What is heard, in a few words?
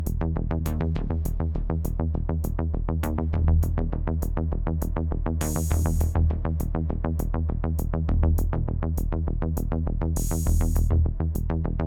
Wave attack Base B; Plants vs. Zombies Battle for Neighborville